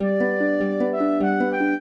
trio, flute harp; Mozart

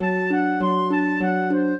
trio, flute harp; Mozart

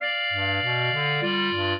clarinet; Mozart

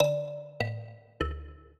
minuet, mbira; Mozart

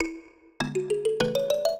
minuet, mbira; Mozart

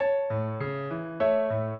minuet, piano; Mozart

